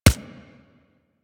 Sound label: weapon punch pistol; Warframe; Weapons; Pistols